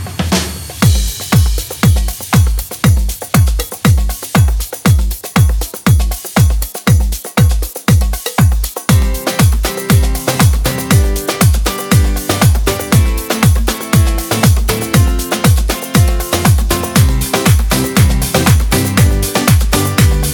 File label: Zumba Latin Dance